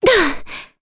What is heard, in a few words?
Vox Landing, jp; heroes; Veronica; kingsraid